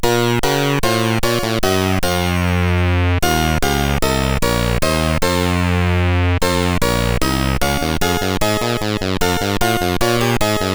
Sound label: Bach CSG8580R5